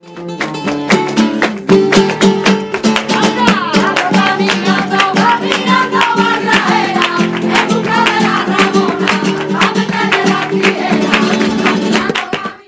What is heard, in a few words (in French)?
espagne; Latcho drom